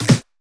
Pre-Shifted Claps; Vengeance Samples

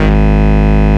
Bass, Root G#; Vengeance Samples

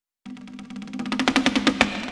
Fill 128 BPM; Vengeance Samples; VEH1 Loops

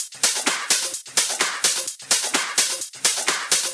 House loops 128BPM; Vengeance Samples

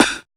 Snare; Vengeance Samples

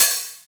Cymbals Open HH; Vengeance Samples

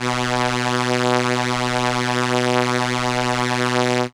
Viola B2, synth, ARP Solina; musicradar-synth-samples

